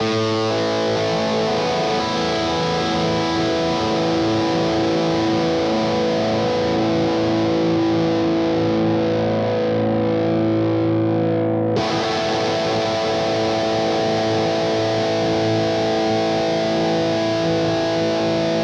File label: Cort KX 500 Bridge Sustain